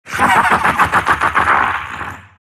silt laugh; dota; siltbreaker

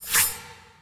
friends slide in; ui

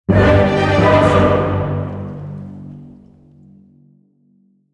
stinger

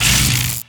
kinetic field end; weapons; dota; disruptor

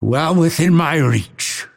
lasthit pain; dota; phantom assassin

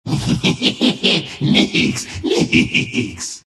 nyx laugh; dota; nyx assassin

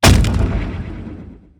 wolf hamstring; weapons; dota; lycan

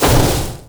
projectile impact; dota; obsidian destroyer

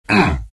pain, vo; arc warden

